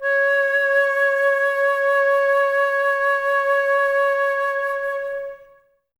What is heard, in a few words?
Flute, Woodwind